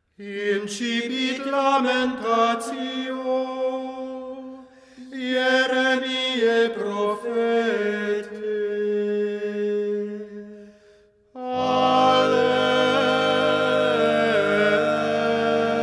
Choir; Assassin's Creed Unity

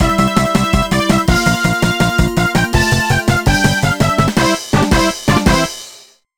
BGM Opening; Sam Power Footballer; NDS

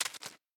Footsteps Bug Swamp

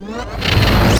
Music Main Menu In; Xbox bios

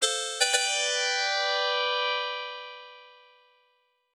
Bonus Points; rygar; arcade

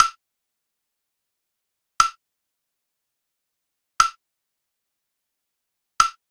Loop beat; Lyrica

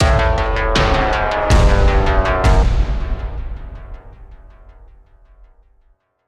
Music Stings; Hangar

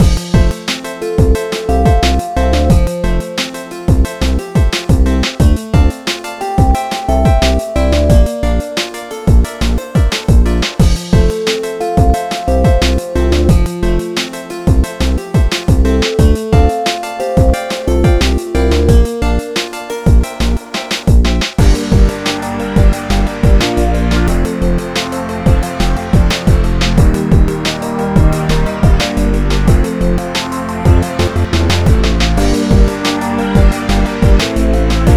Hip Hop; NDS; Lavoro Hip Hop

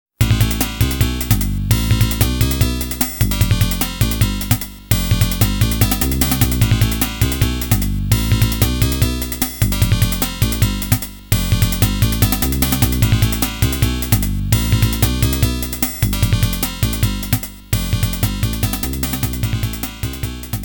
FM Sound - Shop; Monster World Complete Collection; Monster World II

